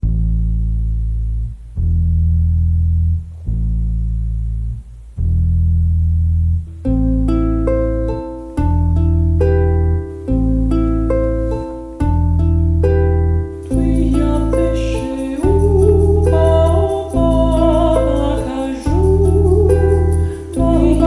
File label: canon des arbres two voices round